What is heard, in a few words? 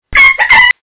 MSN Chat dog